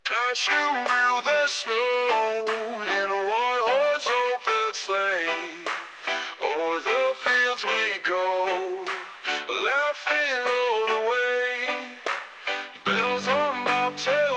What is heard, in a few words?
Jingle Bells